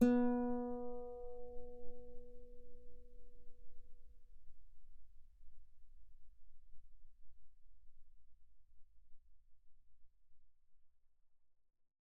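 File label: Guitar mezzo forte sul B B3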